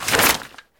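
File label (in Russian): разрыв вещмешка под тяжестью амуниции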